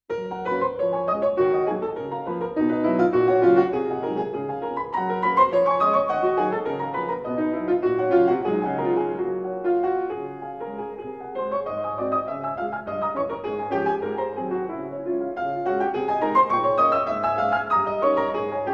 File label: Etude for piano in G flat major; Chopin